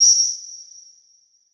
Beep Electronic; Multimedia Internet CD-Rom Flash

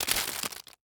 Horror Scary Guts Human Guts Spilling Out Fast

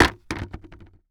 Impact Plastic Bucket Set On Concrete Rattle; Impacts

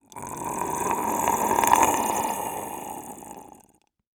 Horror Scary Alien Breathing

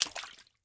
Liquid Water Water Footstep Single Step In Puddle

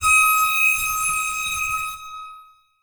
Transition Metallic Warble

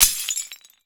Crashes Glass Impact Debris

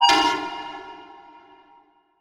Transition Bell Hit; Adobe; Production Element

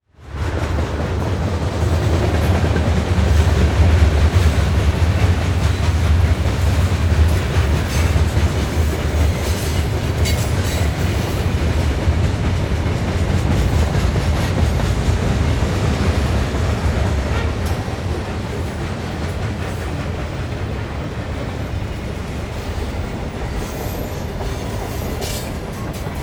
Train Pass By; transportation

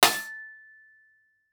Impact Metal Object Hit By Medium Metal Pole

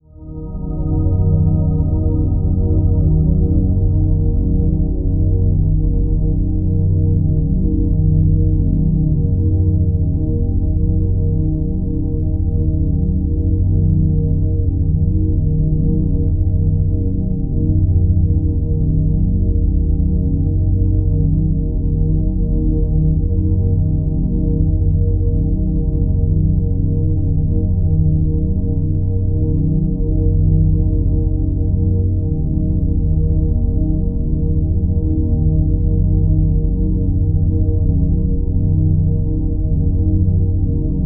Drone Music Constant Fluttering; Production Element Imaging; AdobeCC

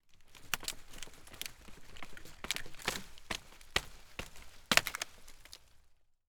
Foley Tree Shaking

